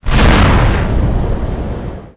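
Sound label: ambience volcano eruption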